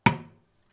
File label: hammer hitting prefab wood panel, Metallic hammer hitting a prefabricated wooden resinous plate.